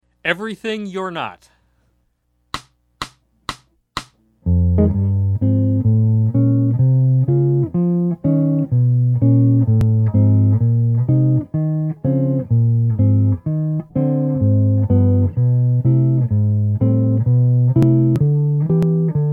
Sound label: Jazz